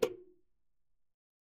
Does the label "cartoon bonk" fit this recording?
Yes